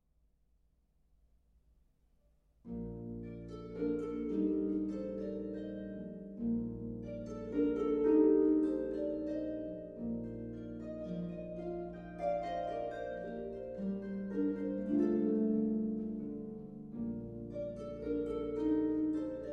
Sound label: Flower Duet, harp